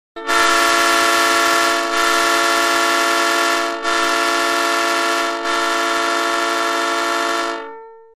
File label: Train Horn Blowing Sounds Car Horn